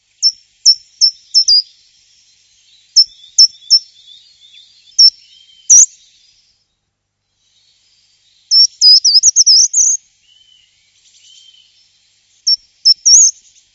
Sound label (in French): grimpereau des jardins, chant